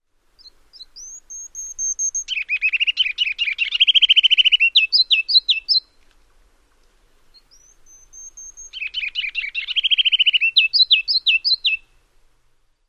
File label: song